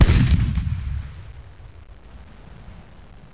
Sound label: CANNON; duke nukem total meltdown